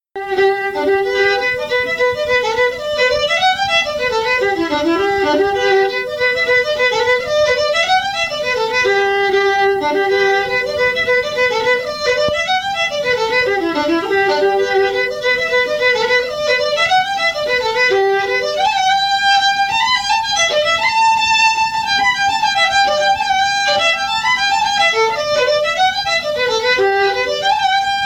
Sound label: sally gardens fiddle